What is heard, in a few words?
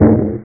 KNOCK ECH; parasite